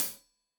Hat Tight, Deeply Sampled Drums Cymbals Stereo Hi-Hat